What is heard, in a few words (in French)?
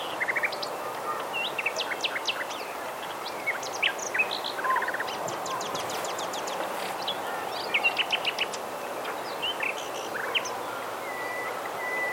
rossignol